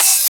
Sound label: Open HH, Cymbals